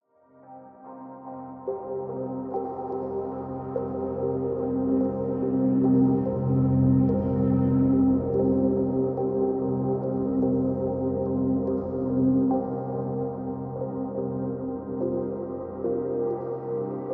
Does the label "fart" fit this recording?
No